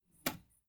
Taking A Paper

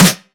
Punch Snare

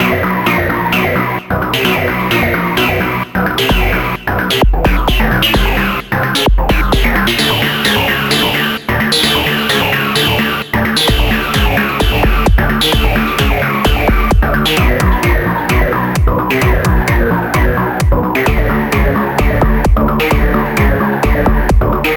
Rising tide

